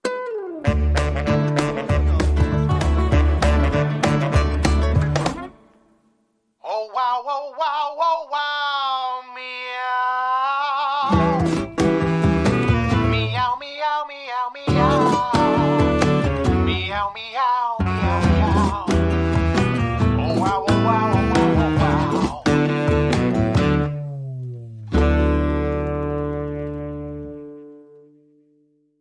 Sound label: Megaphone Version